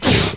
ant pain

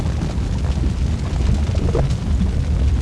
lava

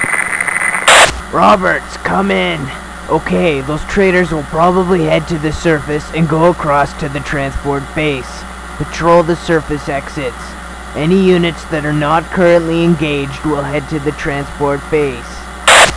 radio

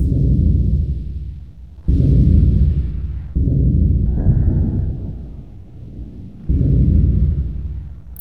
mortar background

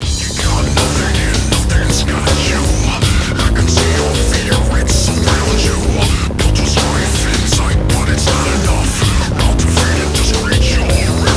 secret music

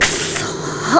death

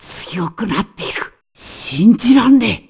death

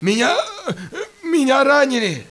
hit bad; freeman

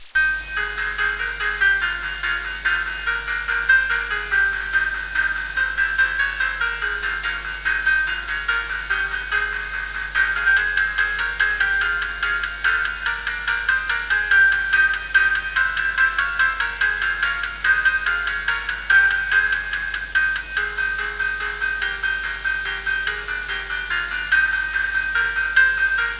outro music

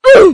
oof

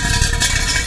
push box

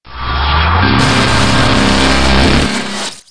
laser

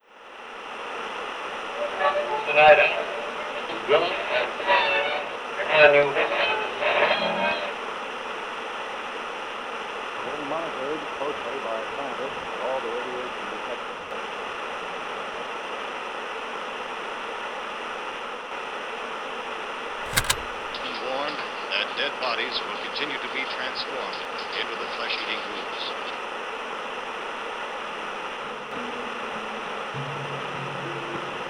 radio

